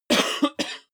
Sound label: cough female; koerpergeraeusche